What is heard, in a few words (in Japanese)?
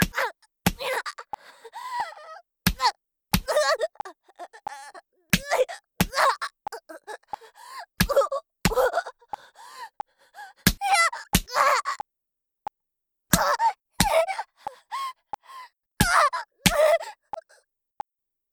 ストレート メト (威力高め) BPM90 ワンツー, メトロノームあり